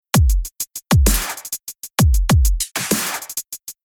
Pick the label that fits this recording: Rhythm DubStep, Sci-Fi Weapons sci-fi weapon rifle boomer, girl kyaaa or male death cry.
Rhythm DubStep